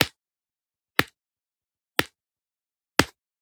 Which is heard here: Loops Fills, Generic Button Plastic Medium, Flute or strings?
Generic Button Plastic Medium